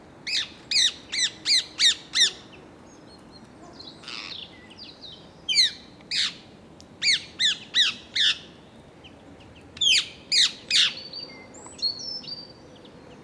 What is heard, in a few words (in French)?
Perruche a collier Psittacula krameri